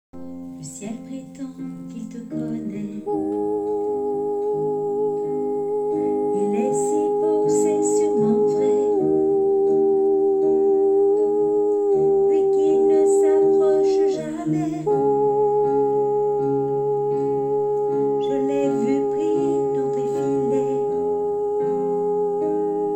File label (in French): je t'aimais basse